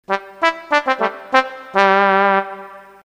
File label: tromb